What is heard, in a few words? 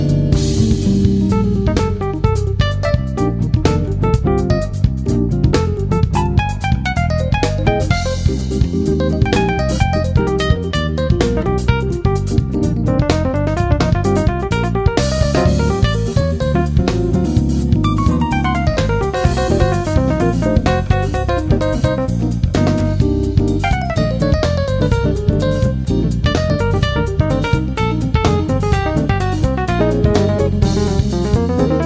Keyboard, orgues Hammond